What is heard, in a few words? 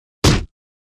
fights